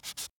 erase

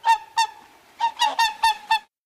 swan effect

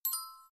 click

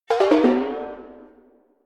wrong

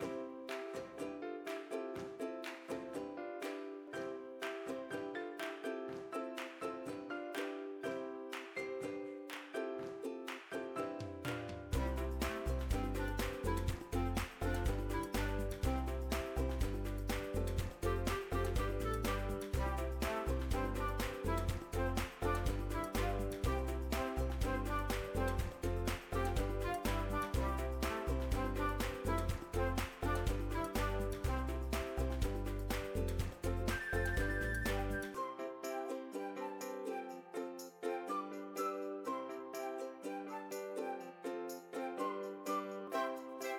music cheerful; bgm

